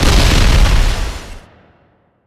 Field Explosion; Starbites

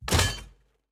Iron Trap

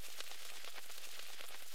Fly Loop

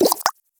Double Jump

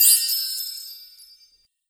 Chimes Magical Bells

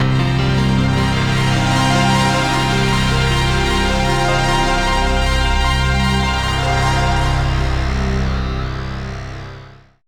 Logo; High Score Entertainment Logo

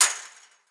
Sfx Darts

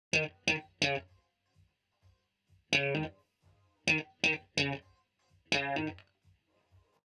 guitar; boner 1

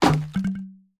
Bamboo Cut

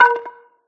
Sfx Baseball

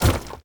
Breakable Wall Hit

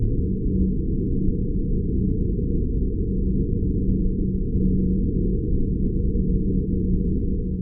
Haptic Falling Low; viewfinder

